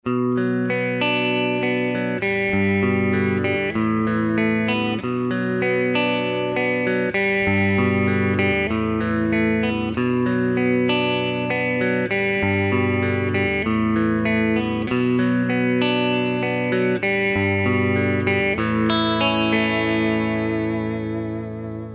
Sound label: Clean